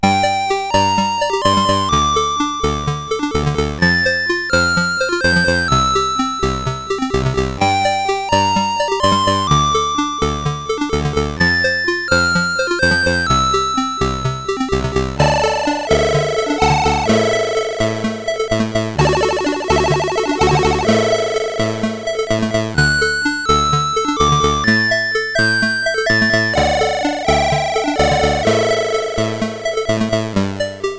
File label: Intro Collection, sid CSG8580R5; Intro Collection part 4